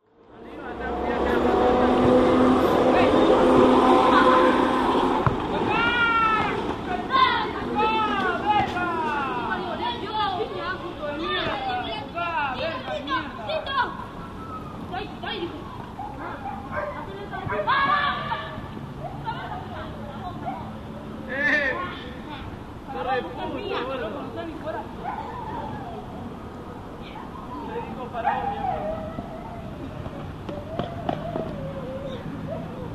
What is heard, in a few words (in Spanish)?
plazas ninos jugando futbol; republica de la sexta